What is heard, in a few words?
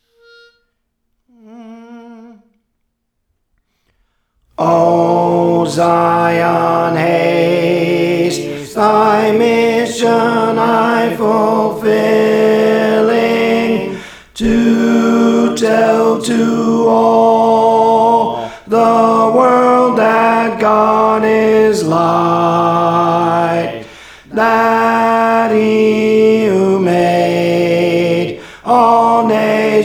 Tenor, Songs; O Zion Haste; Praise For The Lord Training